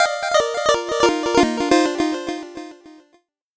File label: 8bit jingle normal